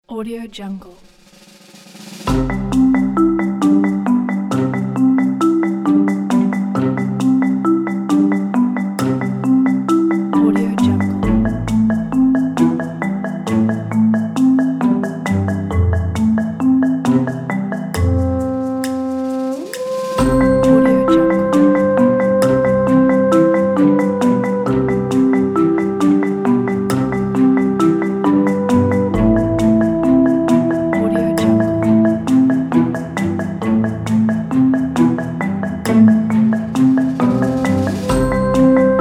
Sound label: marimba